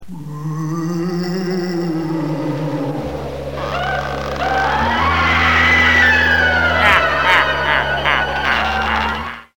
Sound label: scary